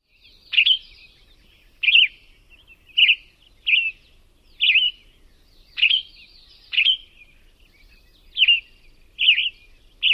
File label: dawn song